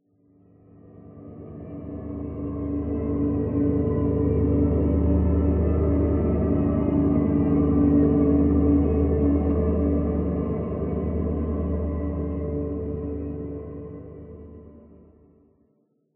Library Main Pad; The Sinking City

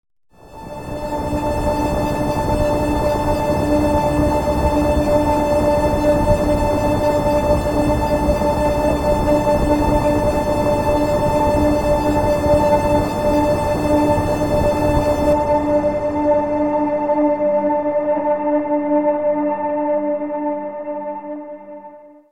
Door Breach Stackup; medal of honor warfighter; Chitral

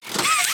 hand retract; Extendo Hands

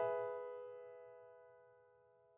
3 notes; Piano app by Yokee

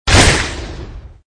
Jump; Learn to Fly

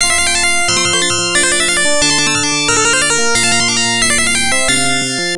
Check Point, Goal; Cycle Mahbou; arcade

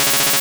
Leer

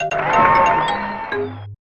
Statue With Crowd; SimCity; SNES; Mario Statue

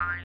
Boing!; Frogger; SNES

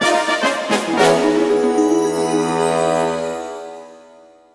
Lose fanfare; Yu-Gi-Oh! The Falsebound Kingdom

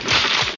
gun cock; Super Trench Attack 2

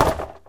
Wood Collision; Angry Birds

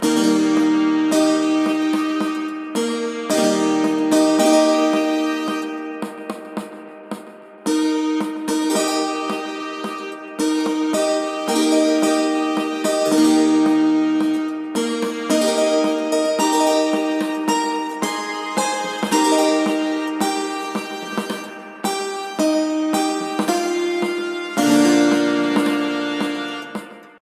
sting child court loop; The Sims 3; Windows

